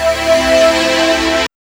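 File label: synth chord; E-Lab; X Static Goldmine 1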